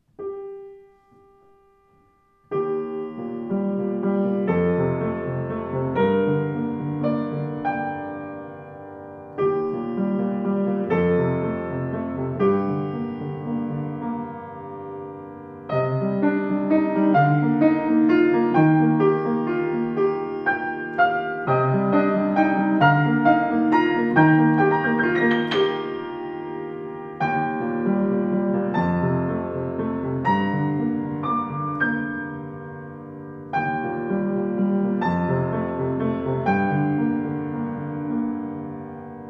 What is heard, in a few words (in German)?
Schimmel Klang, klavier; 112 schimmel schwarz poliert